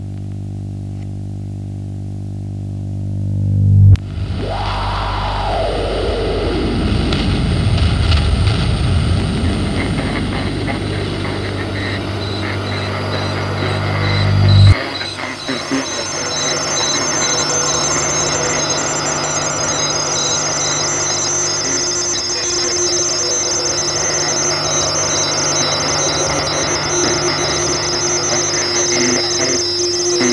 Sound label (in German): Staubrauschen; Timo Kahlen; Wave Farm